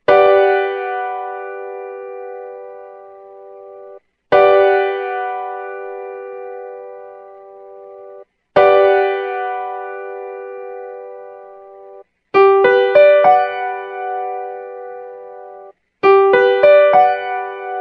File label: Gmaj7 Piano Chord